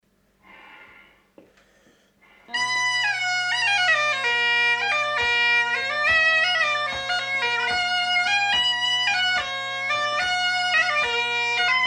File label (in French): Valse; Valse de Bazuel